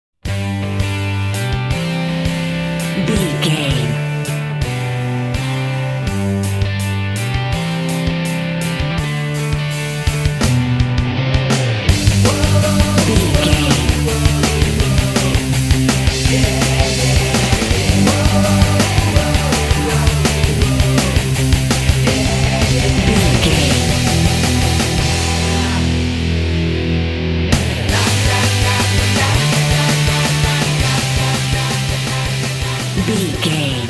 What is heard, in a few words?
skateboard rock